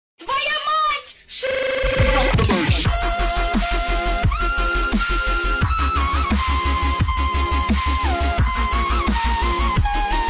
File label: drop the bass